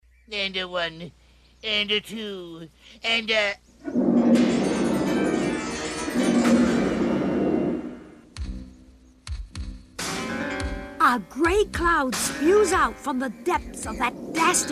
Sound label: zoo chant